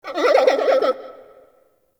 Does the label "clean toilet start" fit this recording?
No